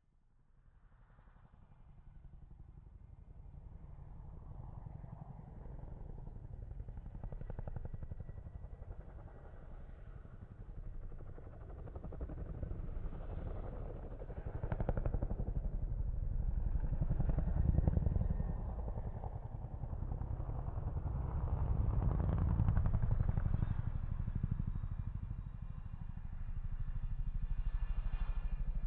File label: Chinook